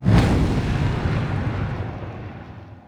arctic impact; winter wyvern

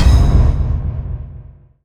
tower aggro; towers

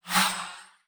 chat message send; ui

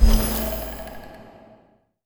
logo rollover; ui; dota

